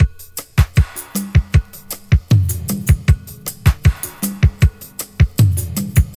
78 bpm drum beat g sharp key, Free drum groove - kick tuned to the G# note. Loudest frequency: 1767Hz